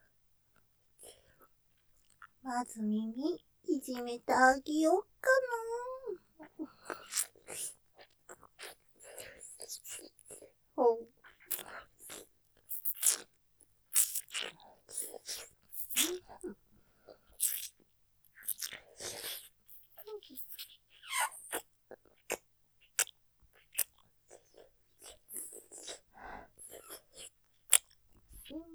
舐め 耳をいじめてあげようね→耳舐め, ASMR; ステレオ; ループで楽しむ！シェフの気まぐれ抜き抜きコース

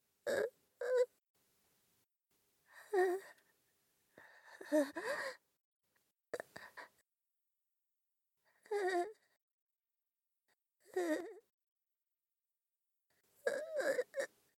恐怖で力が抜ける; 効果音なし

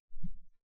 ストン

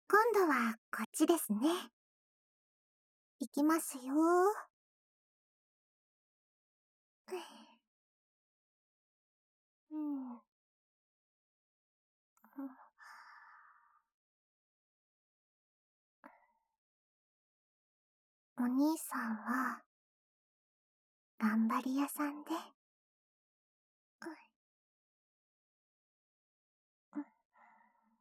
左耳かき（効果音なし

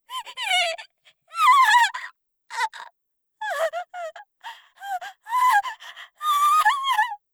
怖がる; 効果音なし

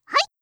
はい！, 合いの手

